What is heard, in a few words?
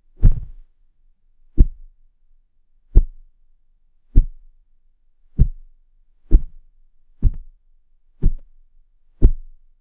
furniture